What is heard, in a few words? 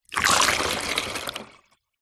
household water pour into plastic funnel